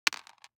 DICE on Cardboard, Throw and Roll, Mini, 2 Two Dice; Samples; ArticulatedSounds; DICE Sfx Party (Extended)